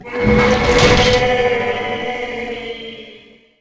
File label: death